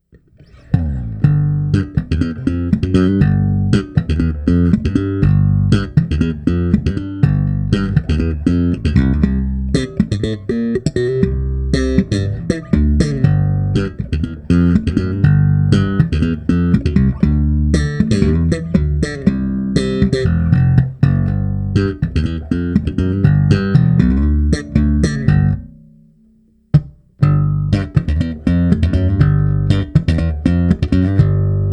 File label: SLAP